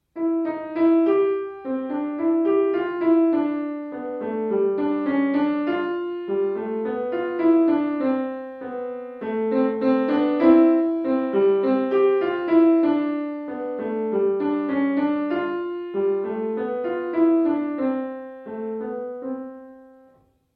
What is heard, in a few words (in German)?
Melodie fuer Zwei; Melodie für Zwei